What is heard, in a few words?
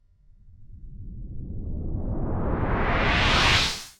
Reverse Swell Transition; slow motion transition